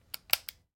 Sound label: Revolver Cylinder Close; SoundsCrate